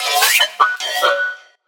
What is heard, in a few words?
Synth